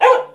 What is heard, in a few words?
dog med inside bark; ambient; animal; Source Engine; cstrike